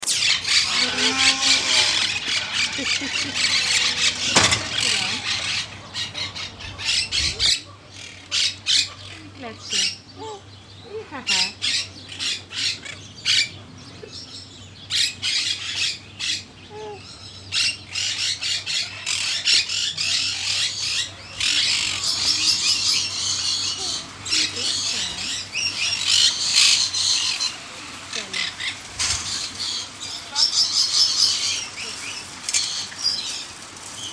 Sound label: attica zoo athene fieldrecording